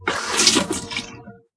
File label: water spill; quake2; coop